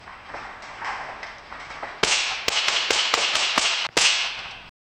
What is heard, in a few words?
flags snap crisply